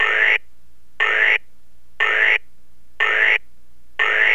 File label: overheat